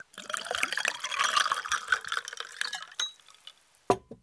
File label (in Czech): nalevani; Mafie 1